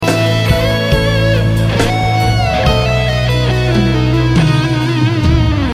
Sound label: ringtone